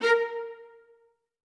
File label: F# A3, AKAI Advance Orchestra, STAC